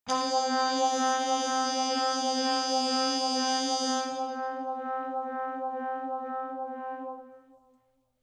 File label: ambient